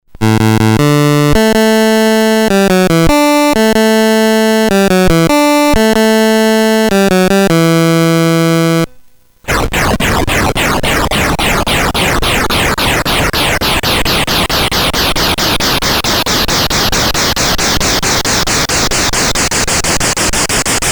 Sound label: BASIC sid CSG8580R5, GAMES; System 65595